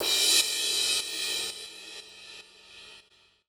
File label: Crash; Equipped Music; Loungin House